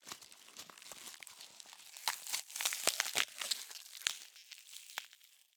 Cardboard, honeycomb, crush, rip, tear, handle, twist; Eiravaein Works; Vaeyan IV